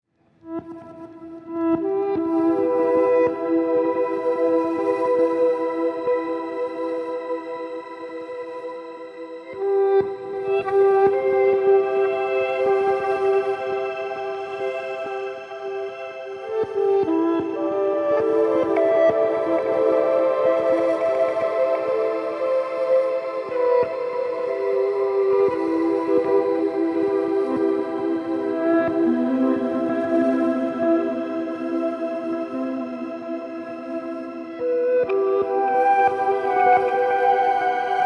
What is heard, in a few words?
Ambient Noodles Reverse